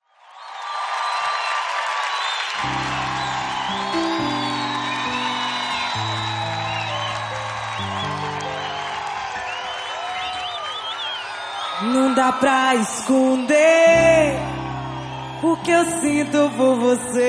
ao vivo em Salvador

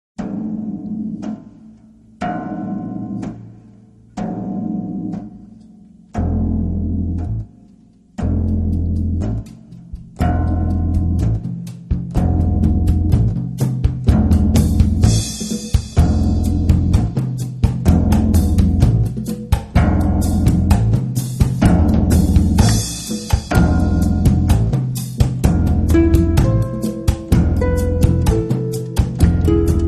harpa bossa

